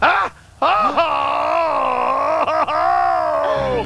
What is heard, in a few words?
Ohhhhh